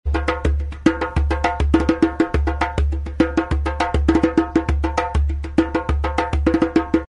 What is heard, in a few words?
african bongo rhythm